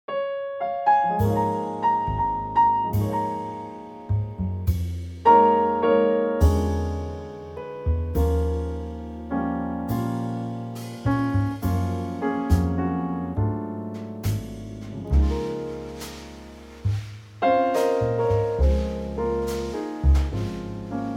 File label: Trio Db